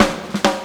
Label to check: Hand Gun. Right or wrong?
Wrong